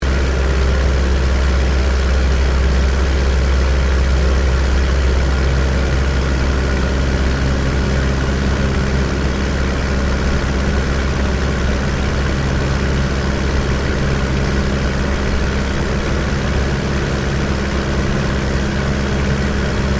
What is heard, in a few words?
AIRCRAFT DH82A DEHAVILAND TIGERMOTH FLYING INTERIOR LOOP; RAAF Tigermoth; Airplanes; Vehicles